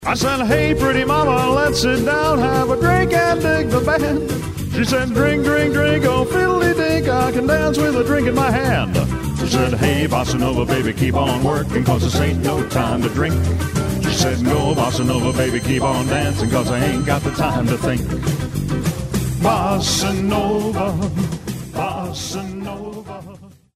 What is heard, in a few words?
bossa nova